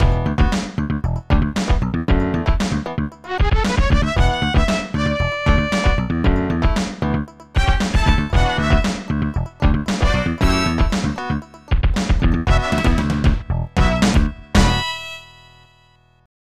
End of Game (Win)

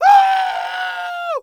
SCREAM Male mono; Infernal Radiation

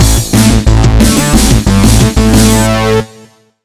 Get Ready Jingle; Astro Rangers; PS4

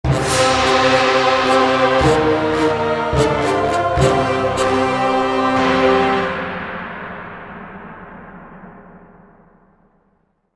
Torch Jingle; Asterix at the Olympic Games

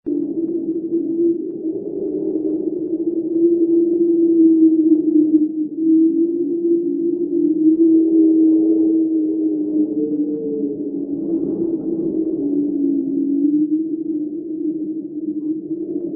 Wind (Sound Effect); Nyaruru Fishy Fight; Android Linux Windows

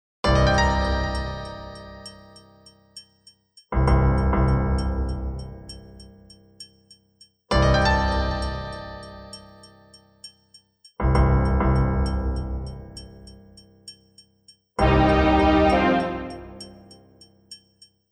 Ominous Atmosphere; rune factory 4 special

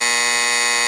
tank rocket aim; blockade classic

